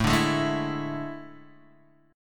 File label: A Major 7th Suspended 4th, Guitar, DADGAD